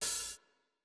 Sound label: hall resonance open hihat